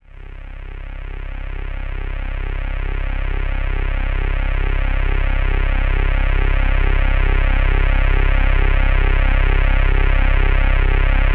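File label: PAD C2 left; USB Soundscan vol.05 - Explosive Jungle; AKAI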